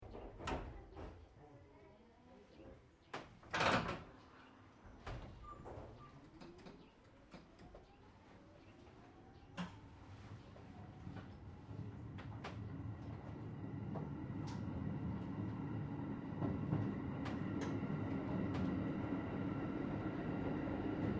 Train JR Regional Aomori Local Drivers Position Startup, Japanese Trains; Vehicles